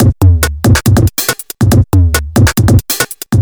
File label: Breakbeat; Vengeance Samples; VEC2 Loops